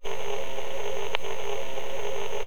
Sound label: striker shield